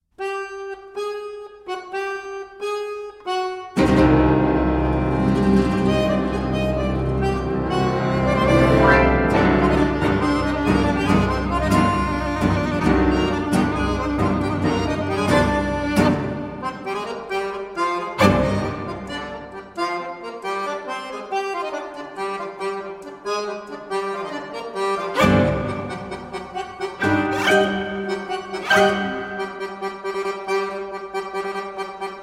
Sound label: Tango